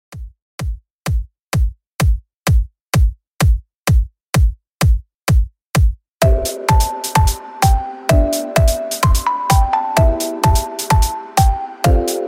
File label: Electronic Festival 128Bpm